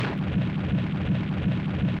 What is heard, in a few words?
Instrument